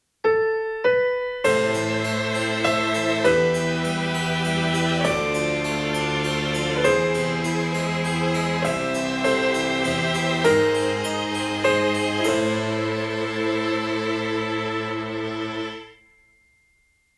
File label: Gameover; Quest for the Sword of Justice